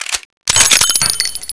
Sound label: webley open, weapons; svencoop; ww2projekt